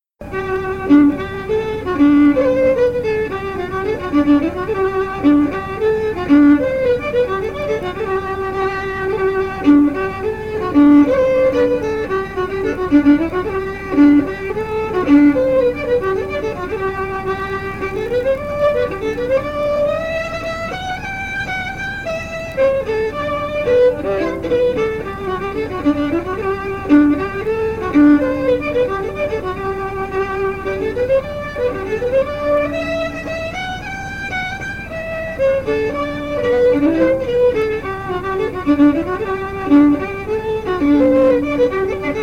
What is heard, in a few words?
fiddle; german beau; SeamusGrantFestival